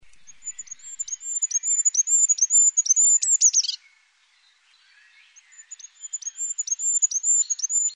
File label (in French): roitelet cri